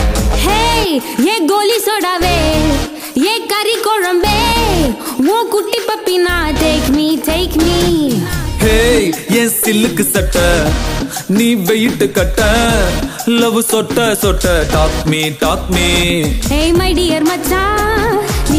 Tamil Movie Song Ringtone; Maari 2

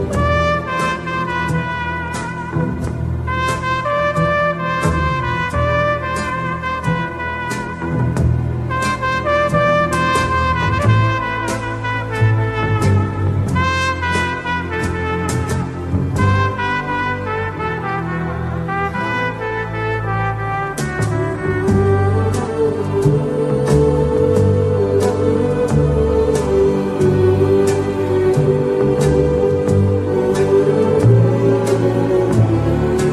Instrumental Ringtone

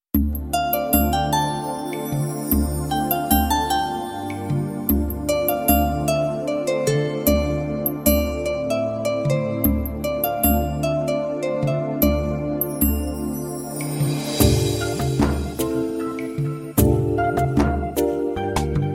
Romantic Music Ringtone; ringtones

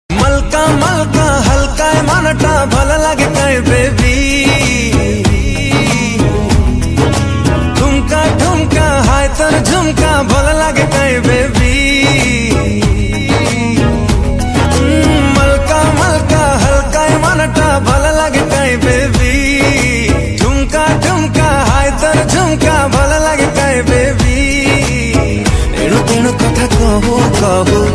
Odia Song Ringtone

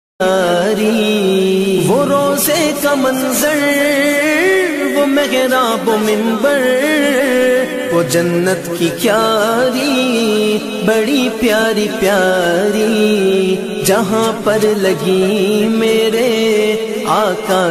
Naat Ringtone

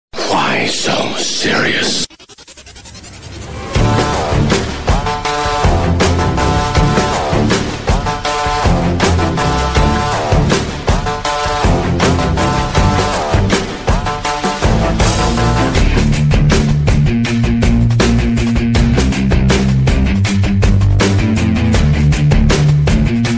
Music Ringtone

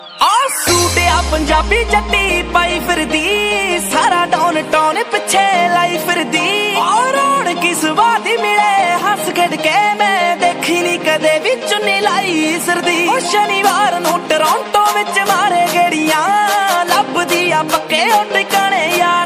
Punjabi Ringtone

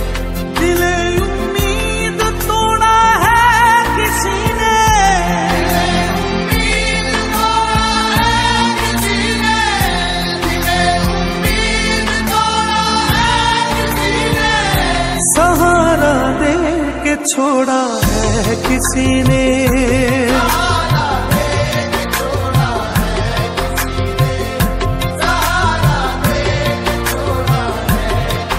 Sad Hindi

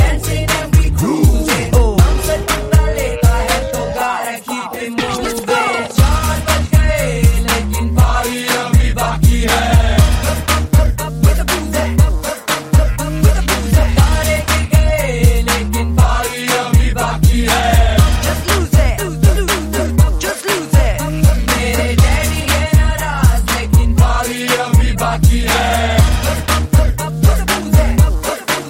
Bollywood Song Ringtone

